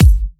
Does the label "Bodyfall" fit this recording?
No